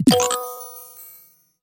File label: gem add; tempala